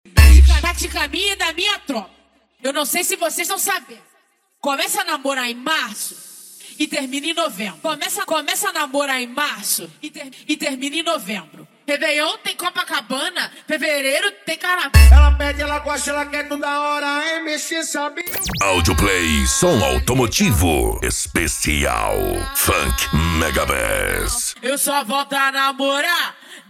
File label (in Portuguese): esp funk mega bass